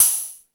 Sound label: Beat Box, E-MU Pro-Cussion